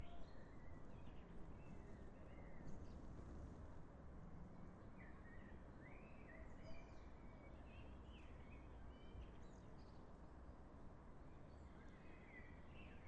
another twelve o clock boo includes birdsong mobile message alerts clock striking